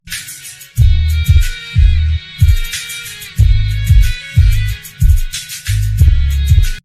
Ringtone; Nokia 225 4G

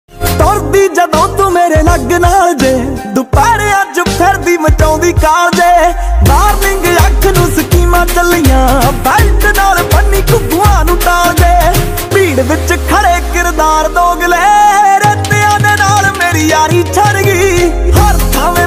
Punjabi Song, ringtone

